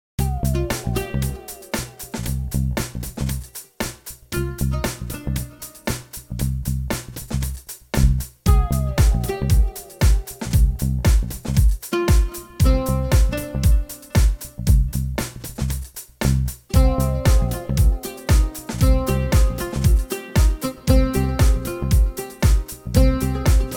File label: Chill oud